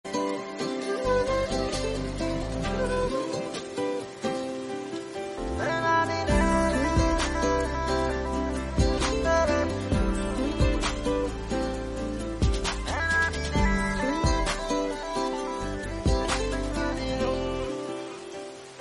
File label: hindi ringtone